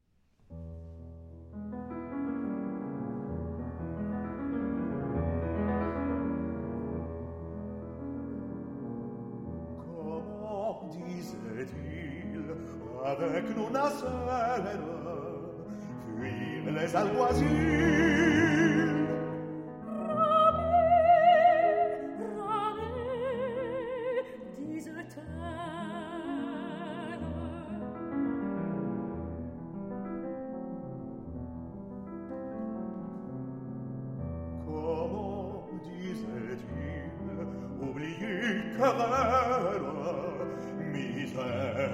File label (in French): Guitare; Saint-Saëns